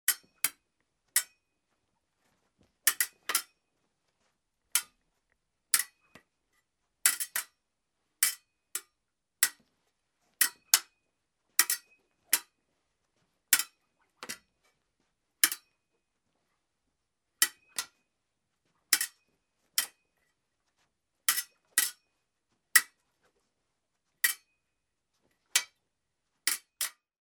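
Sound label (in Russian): фехтование лязганье рапир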